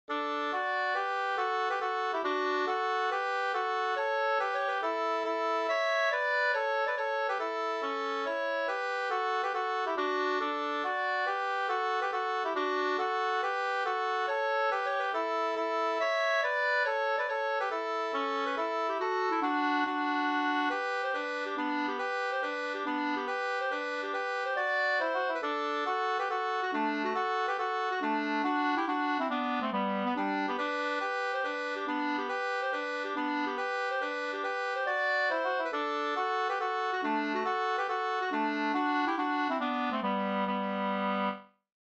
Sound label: mazurka; Rot-Anders mazurka Musko Sd 2st